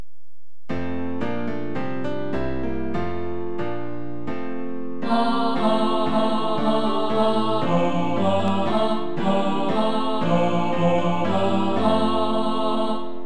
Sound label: tenor, choir